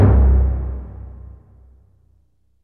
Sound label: fm tympani, single shots; Sonic Foundry (Sony Creative Software); Ugly Remnants VOL-2; Beatstuff